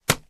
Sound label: enemy damage; Hold Em